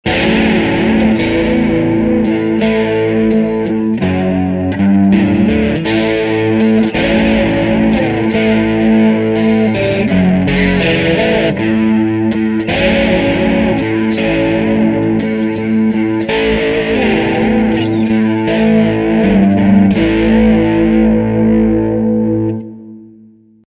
Midnight Mood

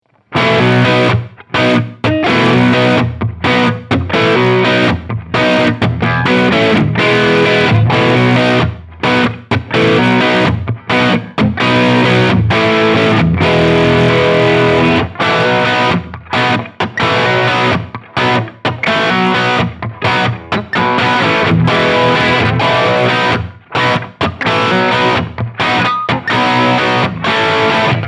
Wampler Gearbox Humbucker Blue Channel

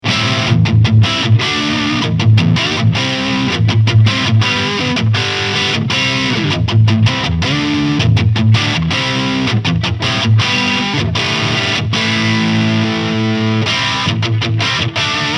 Fender AV II 57 STRAT MN SFMG Heavy Crunch

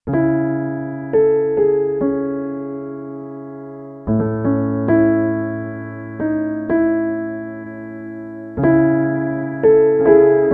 EHX Pico Rerun Piano

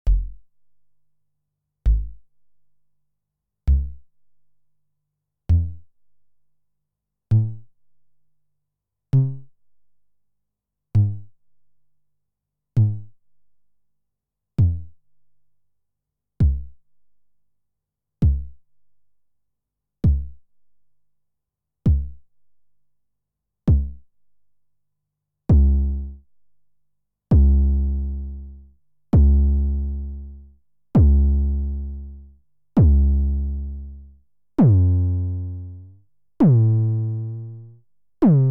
BASS DRUM

